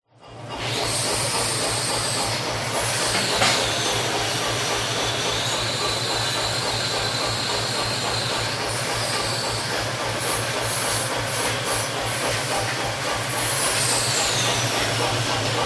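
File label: Black Smith Ambience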